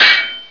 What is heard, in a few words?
empathy; hipitems